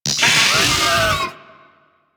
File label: Vocal Death; Warframe; Amalgam Moa